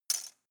cutlery plastic drawer place

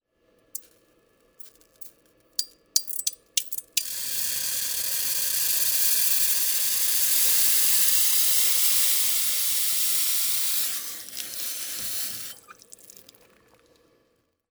cooling

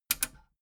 metal button radio click enable fast

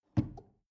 drawer medium close lock soft

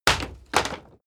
wood pallet drop

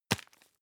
tissue tap hard

